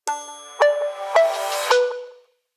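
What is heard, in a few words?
power up; Motorola; Atrix-HD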